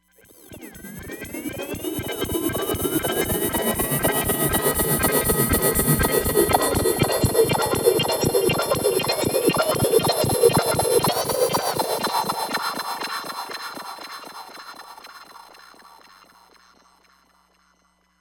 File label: Lektromachin, Roland Fantom XR